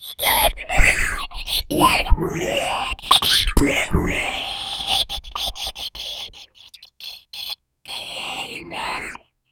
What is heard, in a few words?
a demonic goblin snickeri